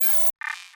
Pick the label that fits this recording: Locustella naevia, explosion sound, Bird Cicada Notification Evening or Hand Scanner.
Hand Scanner